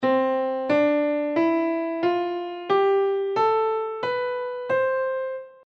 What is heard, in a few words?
major scale